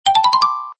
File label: finished Ok; jclic